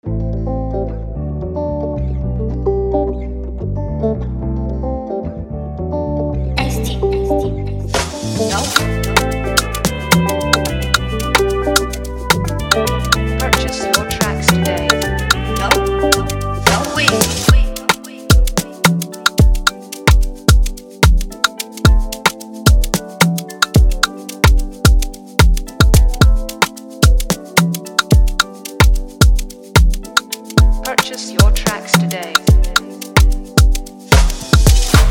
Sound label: FREEBEAT